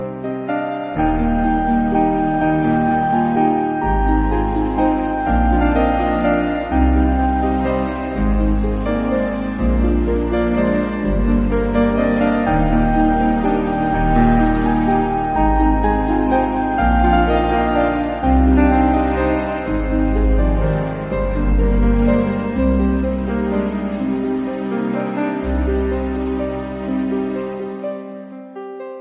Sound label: instrumental meditation flute